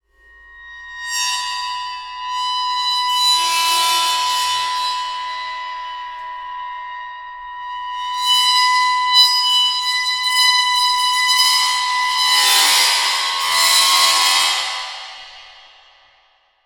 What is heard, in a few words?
Cymbal; Percussion Samples